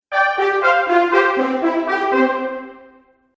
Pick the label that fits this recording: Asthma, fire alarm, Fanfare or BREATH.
Fanfare